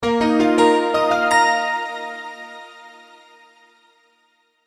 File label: multimedia logo, Musical Identity/Signature